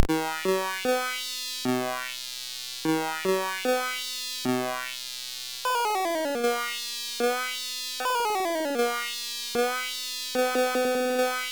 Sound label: SITAR; SID